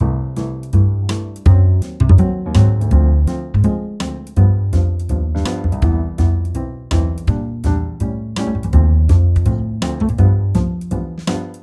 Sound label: Bass; AI Jazz Bass Demo 6